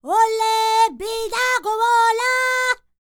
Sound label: Vocal Planet World Voices, NOMADIC